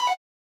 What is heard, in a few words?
graphics digital click; soundscrate